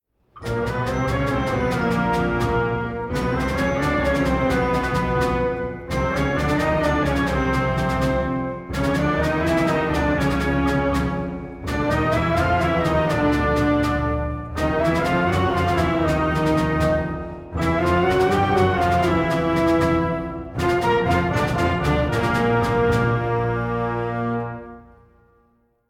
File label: Chromatic Scale Pattern